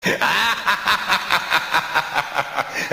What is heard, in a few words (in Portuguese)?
risada malegna